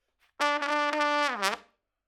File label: Trump